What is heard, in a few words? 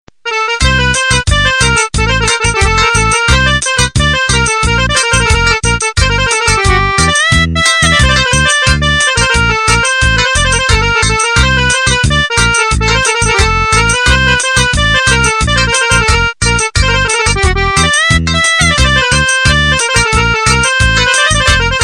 Arbic Ringtone